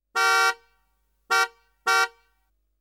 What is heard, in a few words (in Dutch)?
Auto Claxon; KMP Film Video Sound Effects